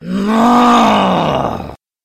monster cursed assassin

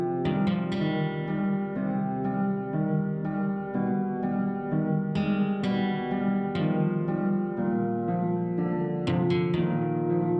misc crypt

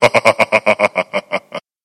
monster vampire

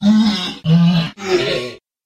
monster hell spawn

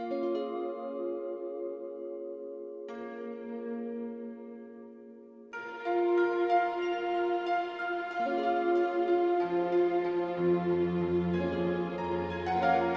wilderness calm grass

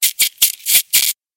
monster mouse

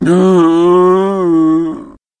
monster bog demon

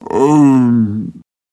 monster mummy

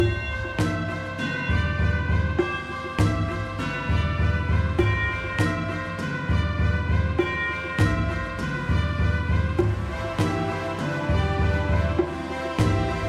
misc castle

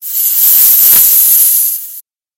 monster corn snake

